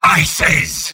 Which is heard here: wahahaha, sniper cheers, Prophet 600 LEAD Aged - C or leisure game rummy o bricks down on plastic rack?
sniper cheers